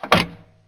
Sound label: power switch